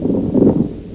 PP STEP

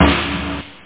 hall snare; Aminet 21; GTI - Schatztruhe; Amiga 8-bit Sampled Voice; 8,363 sample rate

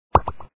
pop sound; bubble t

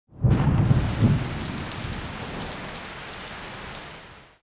thdr

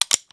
trigger; The Sum of All Fears

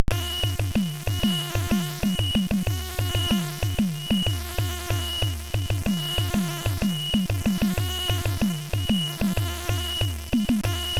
Jungle Sfx; SIDTunes

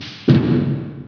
slider

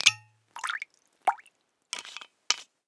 perfume shop; Immortal

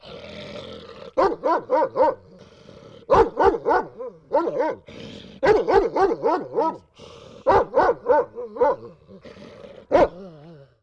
snar bark, animals

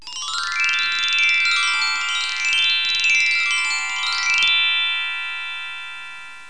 trill; kingarth

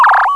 Yummy Launch; Cyclone II

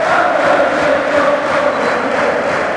Chant; FIFA2002 Demo

